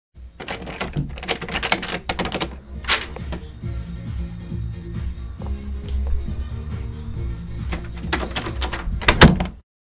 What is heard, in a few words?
The Back Door; 100 sound effects